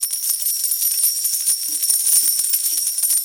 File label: large Win Loop; eggomatic